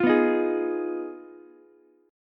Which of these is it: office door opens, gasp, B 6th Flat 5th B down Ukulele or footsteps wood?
B 6th Flat 5th B down Ukulele